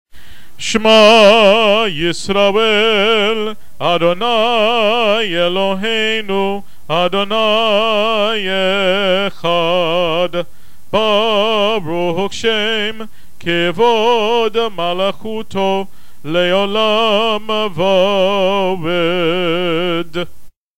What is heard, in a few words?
shema